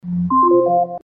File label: Amazon Echo setup mode off